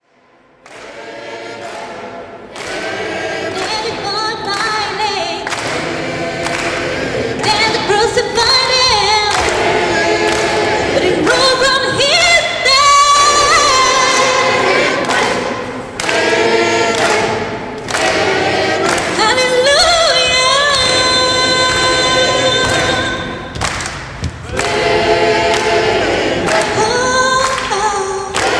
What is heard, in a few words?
amen